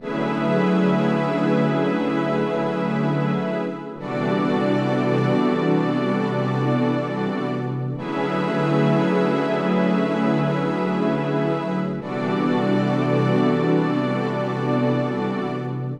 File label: Polypad, Keyboards